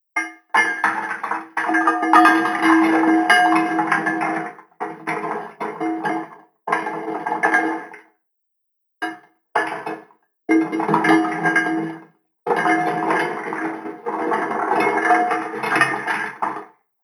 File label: Inside The Old Boat Shipyard Ambience